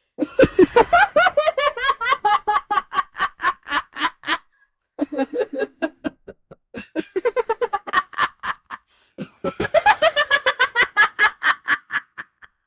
laughs; applejack laughs like men

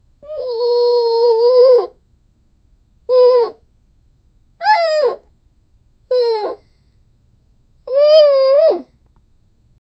a newborn foal neighing; farm

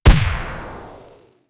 short soft missile launch, explosive tail

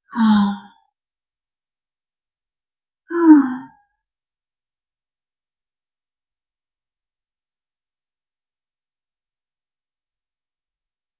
very faint ambiguous sigh

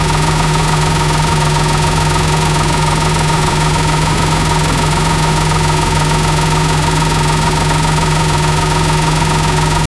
a smooth continuous car

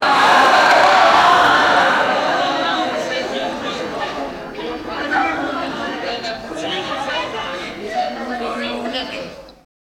not crowded audience

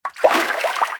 footsteps

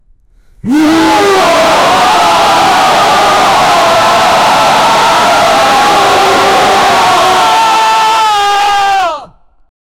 king roaring with anger

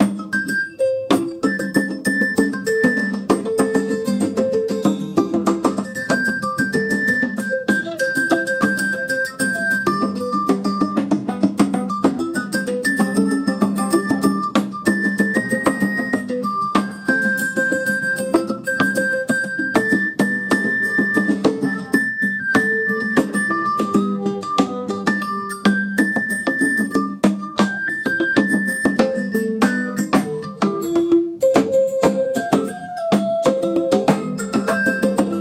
traditional japanese musi

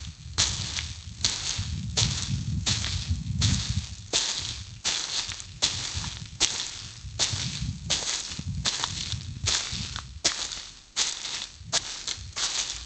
realistic sound of fast f, grass

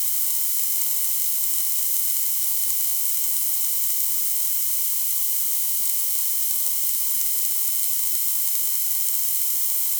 continuous chemical spray

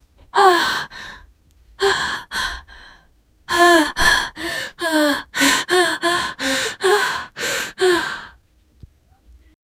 a short sharp gasp of, intake

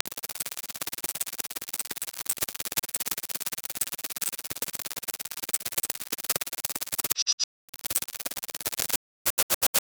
parasites digitals, parasite

